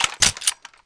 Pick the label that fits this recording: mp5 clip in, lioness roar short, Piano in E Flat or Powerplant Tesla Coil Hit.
mp5 clip in